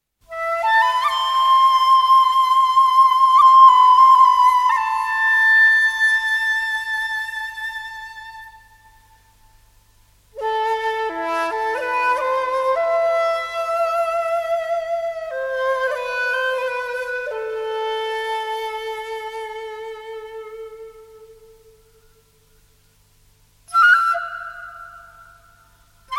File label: shakuhachi & koto jazz funk